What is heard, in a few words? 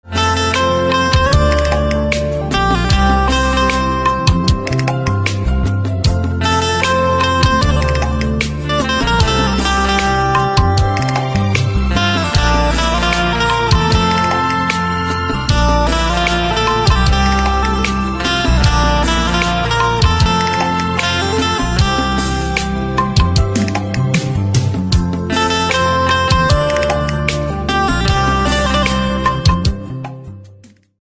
Banjo